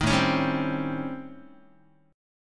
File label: A 7th Sharp 9th Flat 5th, Guitar